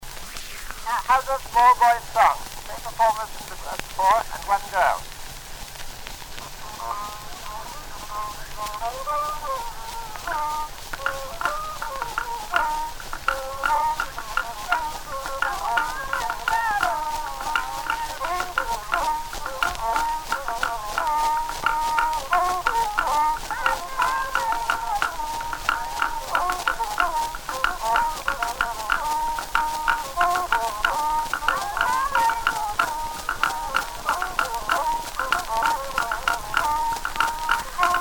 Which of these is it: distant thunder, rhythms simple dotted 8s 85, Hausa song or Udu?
Hausa song